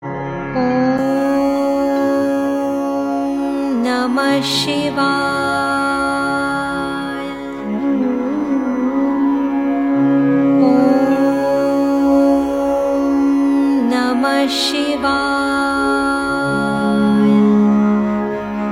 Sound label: Om Namah Shivay Meditative